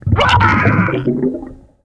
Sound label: drown